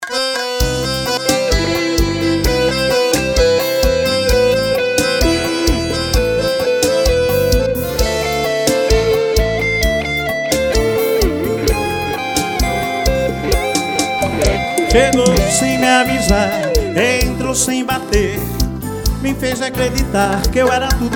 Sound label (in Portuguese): seresta ao vivo